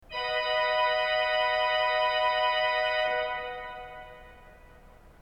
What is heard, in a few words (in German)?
ton, orgel